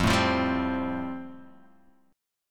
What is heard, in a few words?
F Major Add 9th, Guitar Open G